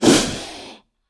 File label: Cobra, Indian Hiss. Close Perspective.; snakes